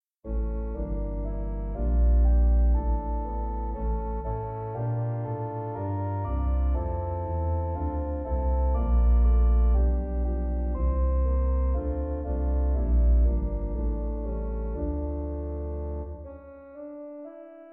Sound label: Fughetta